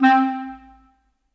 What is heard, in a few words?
Bb Clarinet Solo Staccato; Ableton; Orchestral Woodwinds